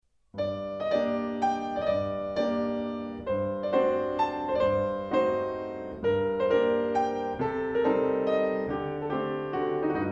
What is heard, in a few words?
Mazurka